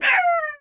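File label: creature squall vocalize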